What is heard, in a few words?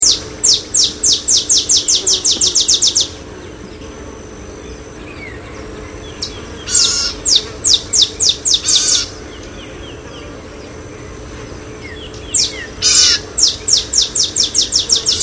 olive sparrow